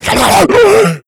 attack, redead; extra sounds

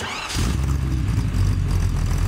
startup, detomaso pantera; lwcars

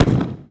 explosion small; serioussam2

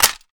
ak74 magout empty; weapons

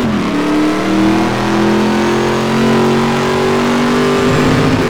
third, detomaso pantera; lwcars

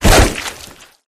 melee swish; predatorcz; halo; flood

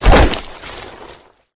melee swish; predatorcz; halo; flood

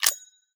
sks remove clip, weapons

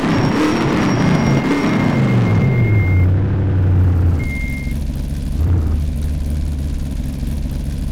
slowdown slow, detomaso pantera; lwcars

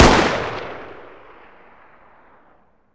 raging bull distance fire, weapons

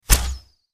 bow shoot; weapons; skyrim

